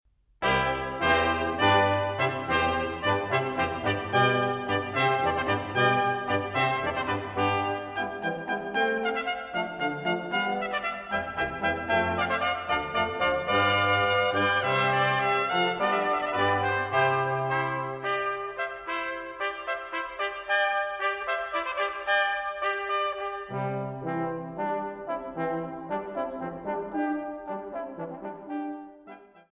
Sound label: Water Music